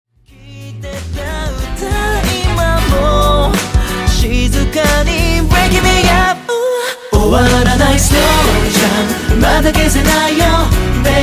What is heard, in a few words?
Slow Jam